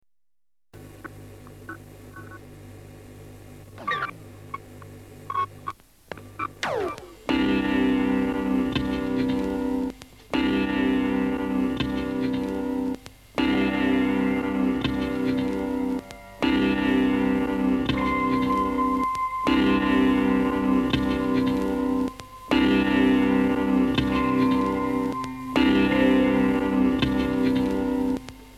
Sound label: Mellotron Music, sound experiment; Mellotron Music No.5